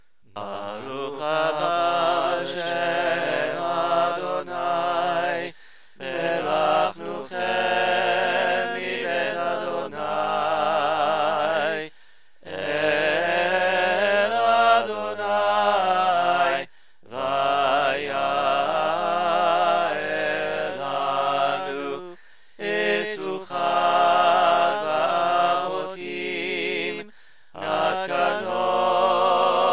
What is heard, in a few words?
Baruch Haba 3 voices; Havdala